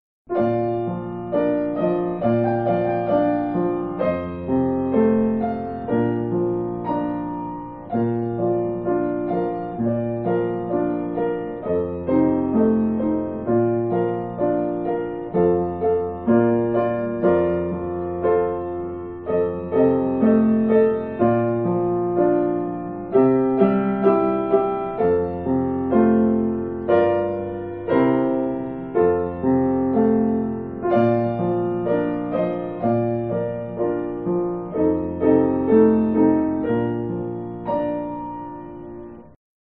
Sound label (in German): klingeling, trompete